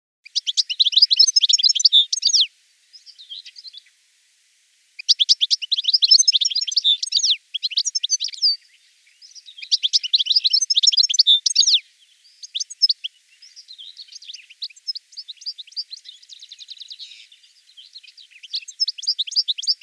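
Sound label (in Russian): chernogolovyy schegol golos, goldfinch voice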